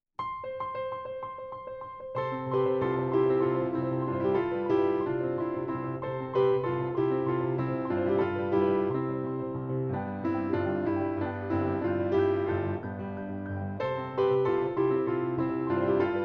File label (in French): Valse; Marie Jael